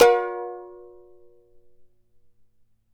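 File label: charango C minor down; Spectrasonics; Hans Zimmer Guitars VOL-2; CHARANGO CHD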